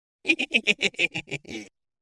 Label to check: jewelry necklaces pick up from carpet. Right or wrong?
Wrong